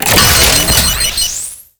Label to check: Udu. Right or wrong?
Wrong